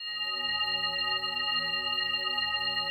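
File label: PAD, atmospheric pads; Best Service; XX Large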